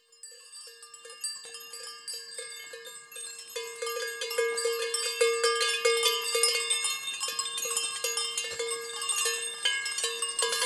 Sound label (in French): seri sera chant traditionnel corse